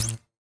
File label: click wrong; Ben 10 - Spot The Difference